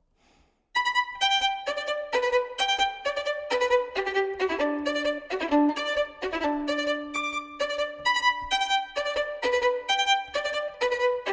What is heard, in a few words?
Violin Etude; Stereo; Wohlfahrt; Violin Etude No.40